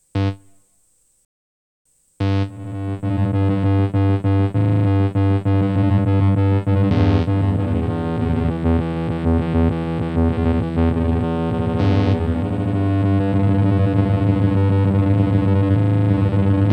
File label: Glitch Size Error; Chase Bliss Onward